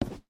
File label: single swap, shelf